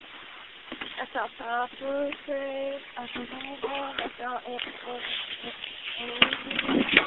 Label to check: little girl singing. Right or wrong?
Right